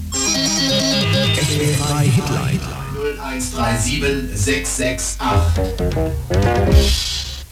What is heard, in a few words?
jingle; swf3 hitline